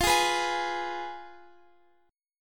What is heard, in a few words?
F Diminished Guitar Open F; strummed